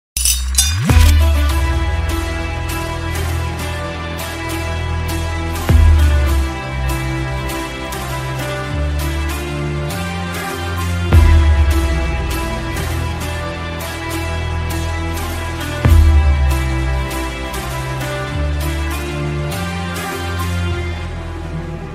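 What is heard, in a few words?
Mass Monster background music Ringtone; KGF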